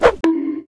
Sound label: head hit; The Elder Scrolls III - Morrowind